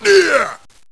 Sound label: eeyah; zangief